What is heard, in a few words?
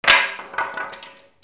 FALLING